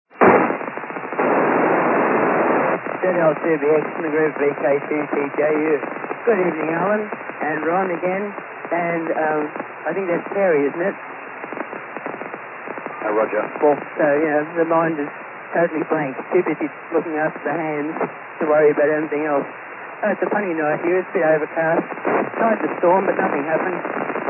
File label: ao-51 echo, Satellite Recordings; 8:08pm Pass; I-Com IC-R20; Sydney; Was a good near-overhead pass. Overcast day today